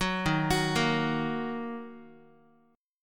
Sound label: D sharp Minor, Guitar; arpeggiated; JGuitar